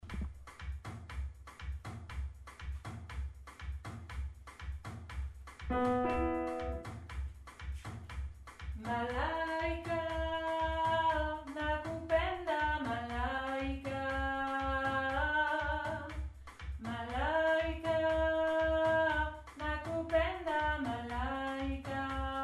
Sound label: Mezzo